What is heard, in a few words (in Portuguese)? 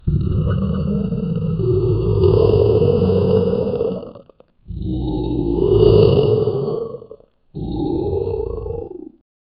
peixe monstro rugindo; monster